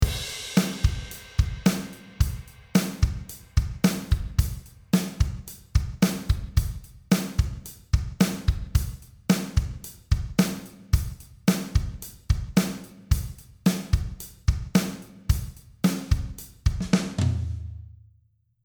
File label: altiverb 8 xl drums L480 strong ambience; audio ease